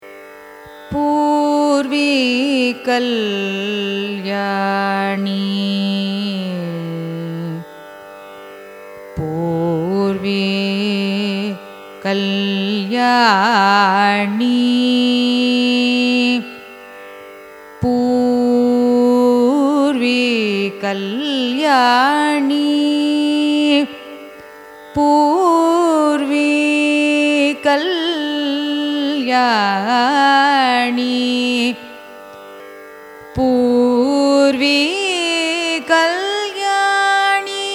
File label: raga poorvikalyani signature, carnatic music